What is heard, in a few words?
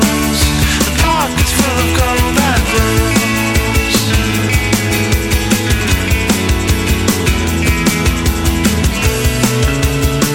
cover mixed